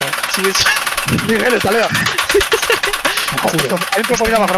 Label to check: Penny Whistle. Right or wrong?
Wrong